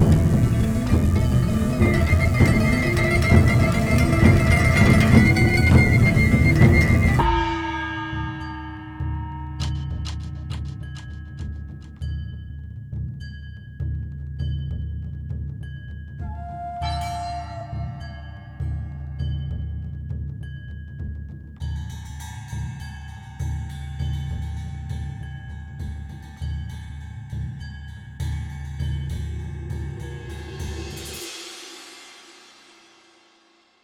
big taiko; Sifu; musicFajarTransition

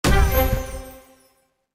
Jingle Unlocked; Invasion Unlocked

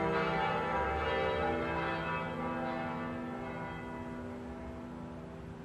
Church; hello neighbor pre-alpha alpha 1

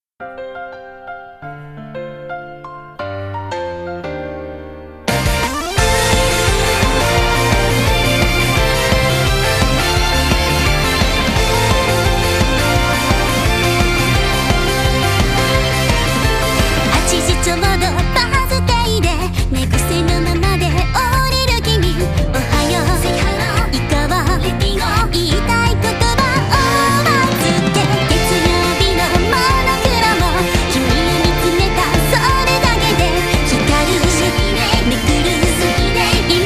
Opening Short; Love Clear; PS4

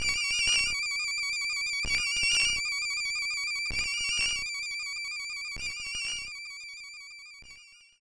Alert; Mother 1+2; GBA

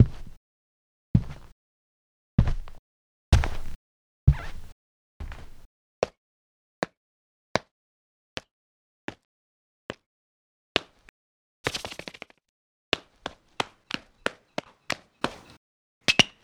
Walking SFX; Yo! Noid 2 Enter the Void

